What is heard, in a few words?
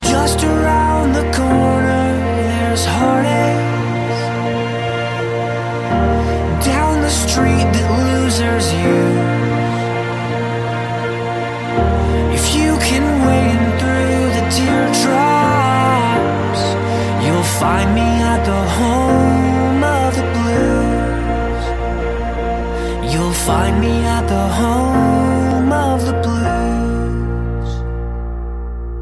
Blues